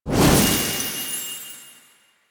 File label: Horse Racing Portal Touch; Duviri